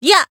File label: Voice attack; nagi